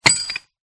impact metal on dish ceramic peices in plastic bag; zapsplat